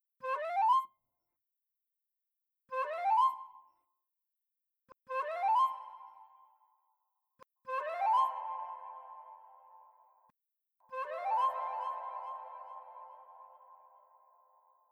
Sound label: Scale – dry to reverberant; Sound-makers